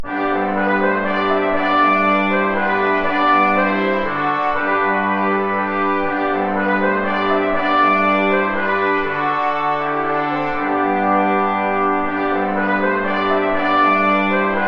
saltarello; praetorius michael

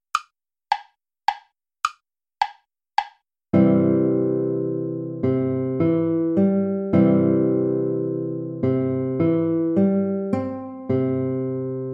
minuetto play along

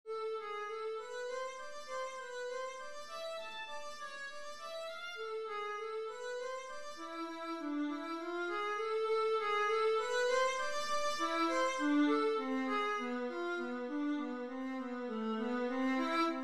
scale study in a minor; lewis alastair

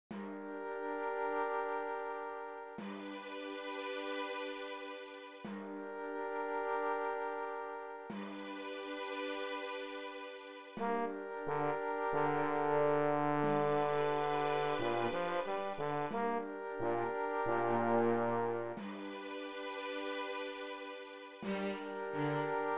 bitonal pieces for school orchestra; robertson thomas

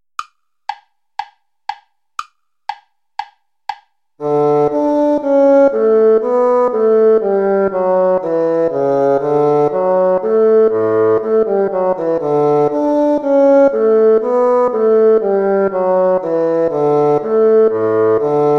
allegro play along

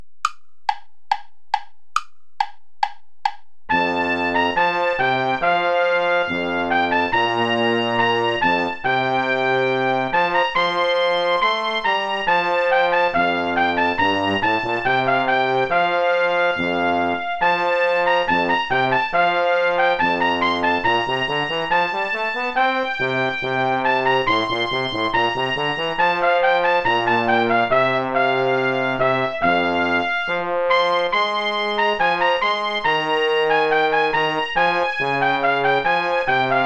ballet play along without trumpet; praetorius michael

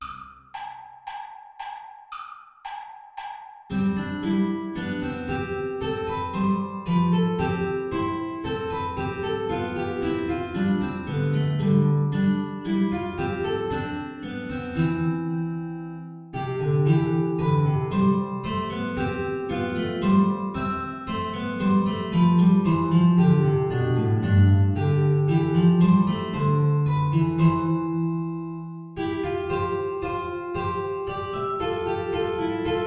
air play along without contrabass

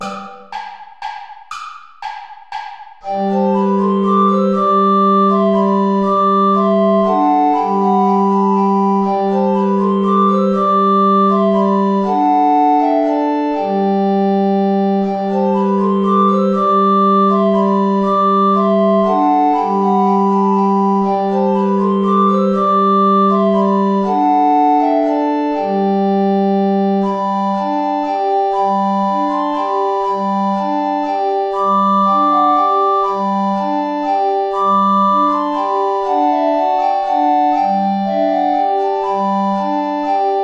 saltarello play along without tenor recorder; praetorius michael

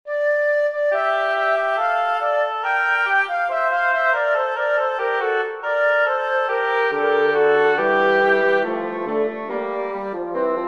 for woodwind quartet; flecha mateo; teresica hermana

